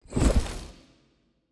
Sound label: unit swap; player